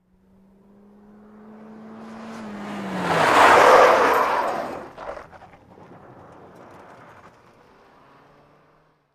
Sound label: Sm Car 180 Rev Skid, compact